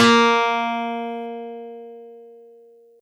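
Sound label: Easy Clav DX100 A#0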